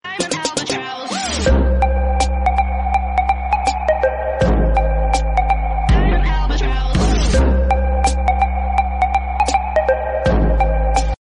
launchpad cover; samsung s8

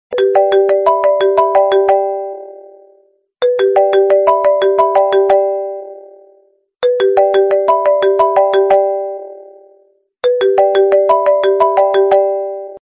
sms

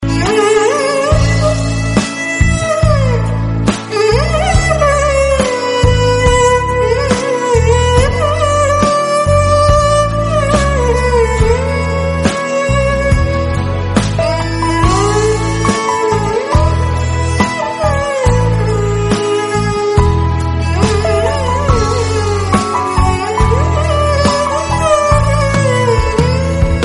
bangali sad violine

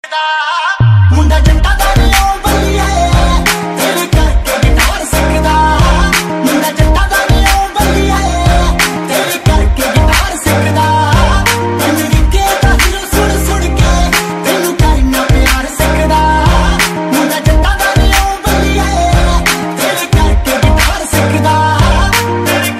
guitar

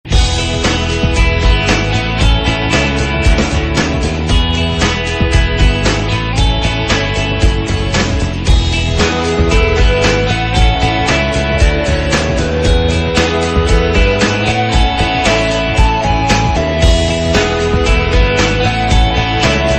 samsung galaxy note 8 mix

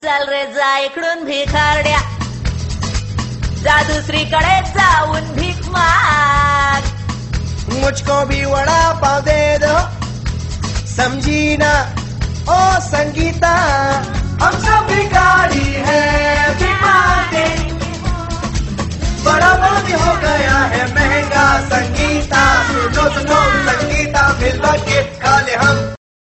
marathi new tone

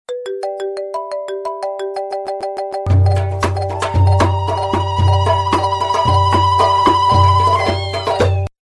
arabic iphone

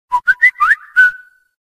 samsung android

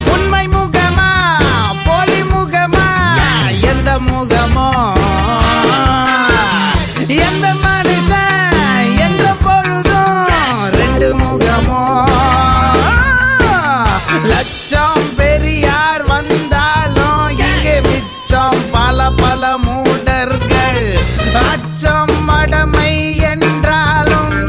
love tamil tune

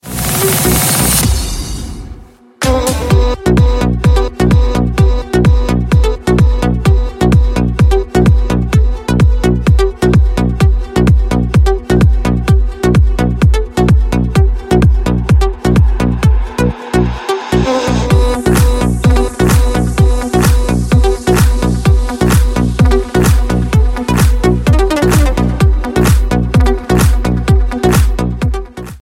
iphone 6 violin trance

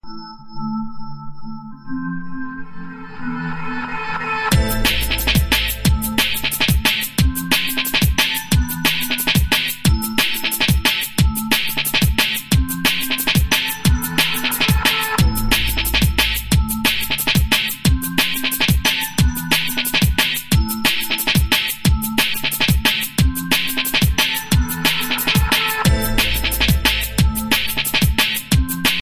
dnb electro

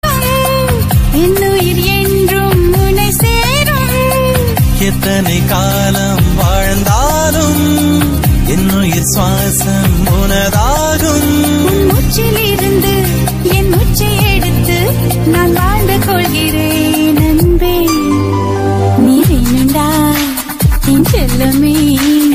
tamil emotional

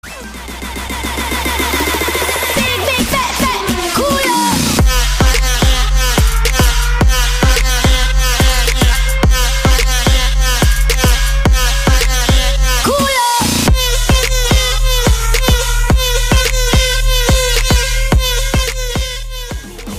smashup bollywood, ringtone

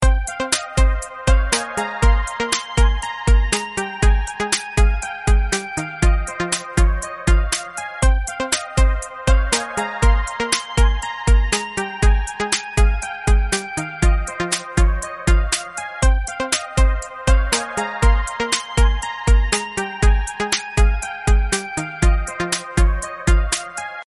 piano rock; apple

